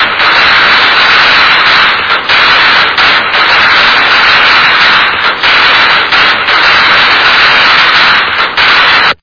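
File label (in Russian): фейерверк близкий шумный резкий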